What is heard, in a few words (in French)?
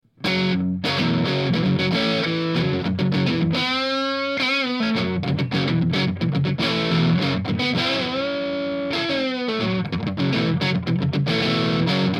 truebucker crunch manche, guitare; Van Zandt Truebucker; Test micro Van Zandt Truebucker